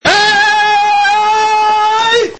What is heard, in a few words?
heeytt; kemal sunal